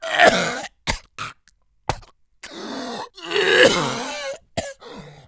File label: cough; Messiah